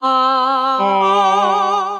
Chromatic Voice, Basic Voices; Ableton; Factory Packs; Voice Box

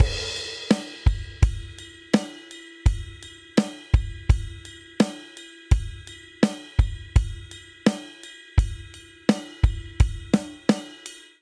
Straight Eighths Rock Chorus 84 bpm; MIDI Clips; Ableton; Factory Packs; Drum Booth

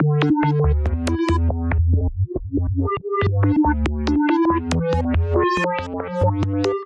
Bass Station D Minor 140 bpm, Bassline; MIDI Clips; Tonal; Skitter and Step; Ableton Factory Packs

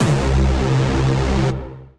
Bass; Planet X Bass; Synth Bass; Ableton; Beat Tools

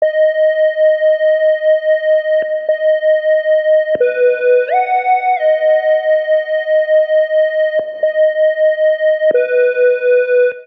Sine Ab Major 90 bpm; MIDI Clips; Tonal; Melodic; Ableton; Factory Packs; Chop and Swing

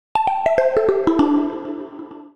Oldbellz A Minor 83 bpm; MIDI Clips; Melodic; Ableton; Factory Packs; Chop and Swing

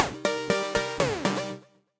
Synth Rhythmic

